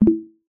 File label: module disable; minecraft